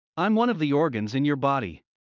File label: Heart fast; Classroom humanbody